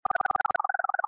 Dial

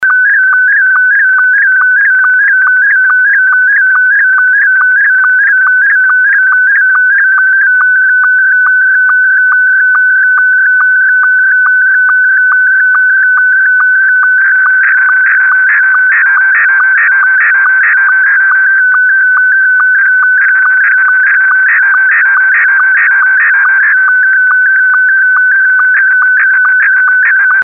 Slice

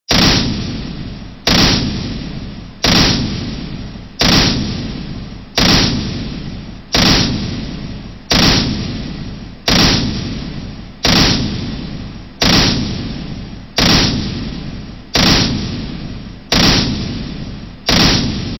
March

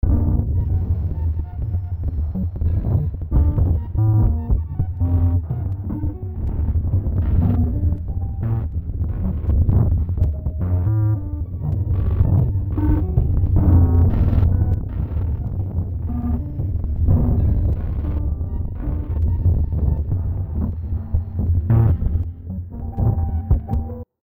Tune in

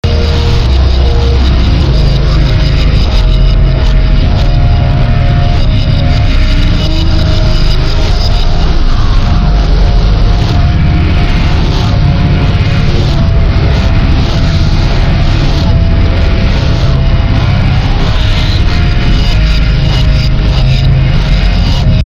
Blueflame

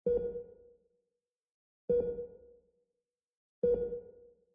Asleep

